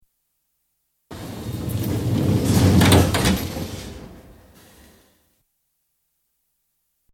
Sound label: London Underground, Central Line train sliding door closes; BBC